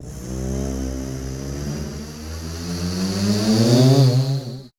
WEIRDOS, Voice Spectral

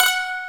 YANG-CH'IN; AKAI S6000 CD-ROM - Volume 1

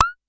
Pollard Perc, Syndrum

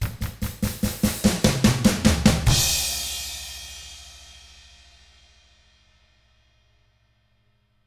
rock 6/8, drums; ILIO; Double Platinum Drums 1

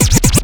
Scratch Up; Mo' Phatt; Digital Sound Factory Mo' Phatt

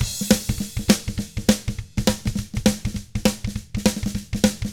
SHUFFLED, Drums; ILIO; Double Platinum Drums 1

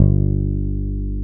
Basses, Roland

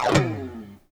CHORUS LID, Guitars; Spectrasonics; Hans Zimmer Guitars VOL-1; FUNK CHORDS

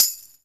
CLIF TMBRN

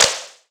Clap, Waldorf Blofeld

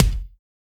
BEAT KICK; B.BEAT

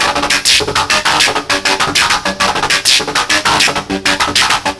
Sequence 100 G; Sonic Foundry (Sony Creative Software); Crimson Blue and Fabulous Horncraft 4 RnB; SignalsII

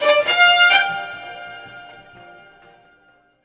STRG; USB Soundscan vol.32; Roots Disco; AKAI

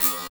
HH, Drum & Bass, 160 BPM; Ueberschall; Drum & Bass Flash Trackz

